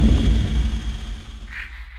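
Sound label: Music Volcano Light 120; from dust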